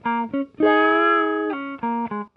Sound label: 101 Pop Guitar Fill Db; Pop Rock; Electric